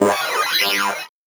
VocoBit Noise, 8-bit; musicradar; 8-bit bonanza

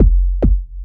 Kick, Trance

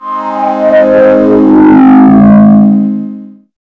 SE-1 Overdrivin'; E-MU Producer Series Vol. 6 – Analogia Project; Strictly Synthe

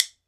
WOOD BONE, Ireland

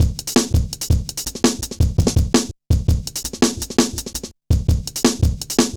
Jazz Shuffle, Drum Loops; Zero-G Jungle Warfare 1, 2 & 3; 90 s Sample CDs

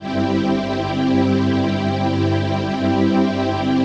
PHASE PAD; Best Service; XX Large Pads; Sample CDs

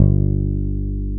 BAS; Best Service Gigapack 2

